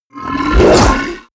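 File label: fishman bark, creatures; kreaturen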